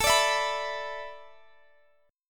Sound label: A Suspended 2nd Suspended 4th, Guitar, Drop D